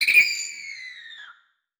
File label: Multimedia Internet CD-Rom Flash Animation Cartoon